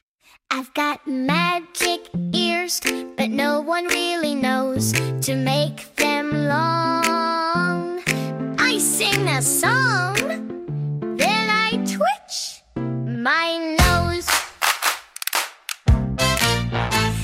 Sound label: floppy magic song